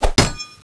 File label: Blow